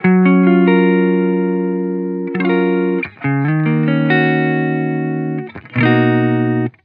Vibrations Chill Guitar Loop 140 BPM F# Maj; Cymatics; Vibrations Guitar Sample Pack

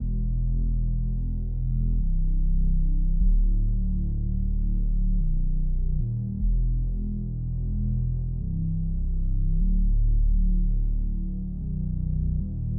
Nostalgic Melody Loop 150 BPM E Min Bass; Loop Stems; Cymatics; 2020 Melody Collection

